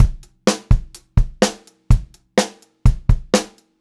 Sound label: 126 Pop-Rock Drumloops